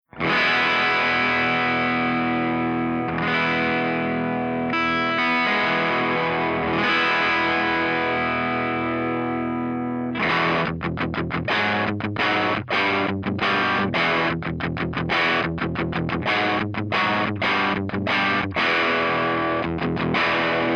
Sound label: FENDER TWIN DRIVE P90